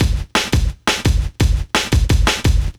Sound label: Walk Break, breaks